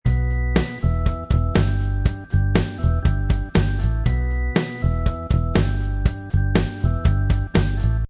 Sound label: Spooky Map Music; kickbuttowski; mellowbrook